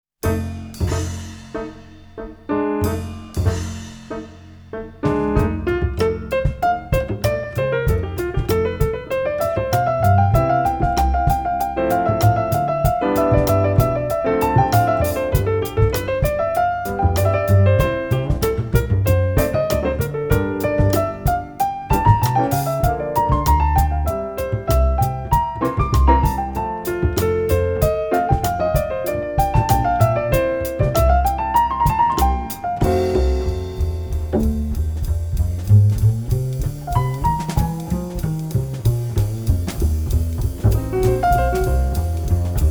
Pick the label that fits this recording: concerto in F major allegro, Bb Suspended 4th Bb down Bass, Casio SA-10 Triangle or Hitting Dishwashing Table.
concerto in F major allegro